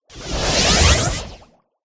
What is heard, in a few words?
hydra buff